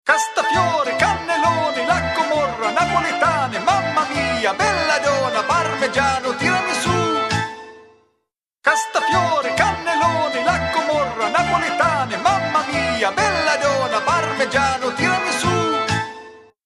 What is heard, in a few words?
Tarantella, Ringtones